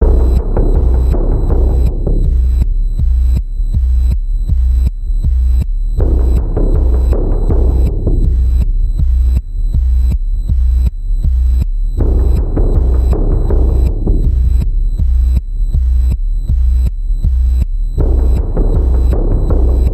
Panning Pulse B, Key of B

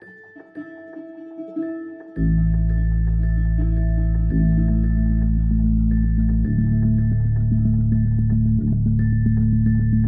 Neutral Lights Emerging, No Drums; 60sec

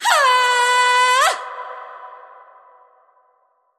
Vocal Fury Female War Cry

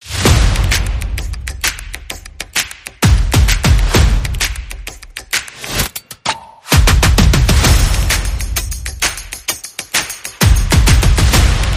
Life Of The Party, Percussion and Effects

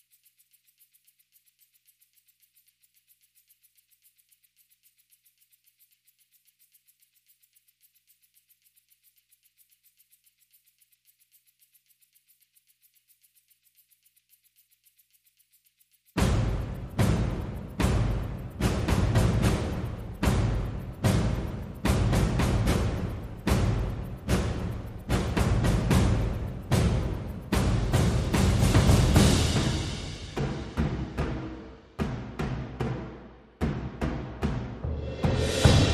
Percussion Only

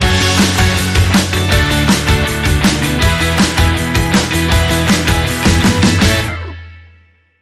Bubbles Sting

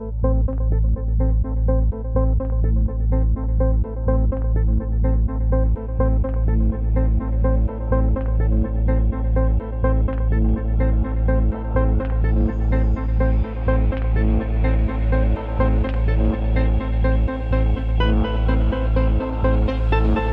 No Drums Short Version

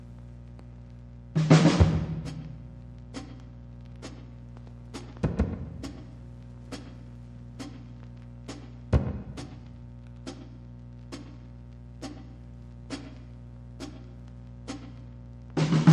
Drums

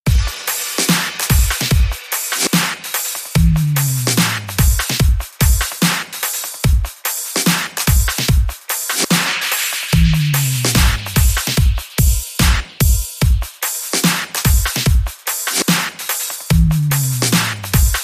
Mental Beat

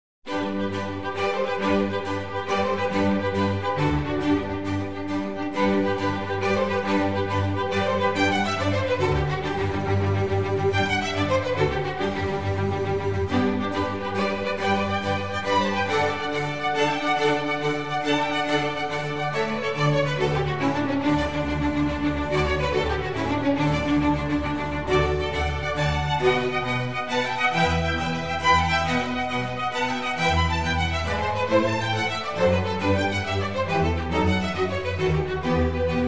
Concerto for Strings in G major; RV151 Alla Rustica; Antonio Vivaldi

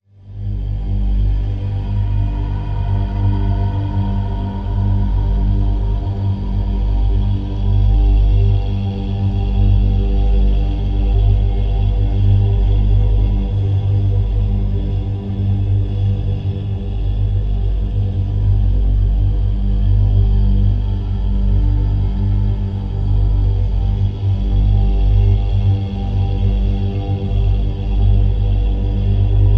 Drn Crevice G

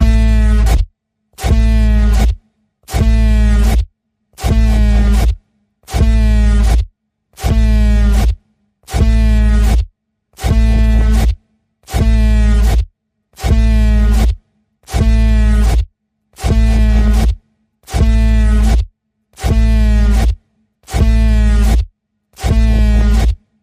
Panning Pulse A, Key of A

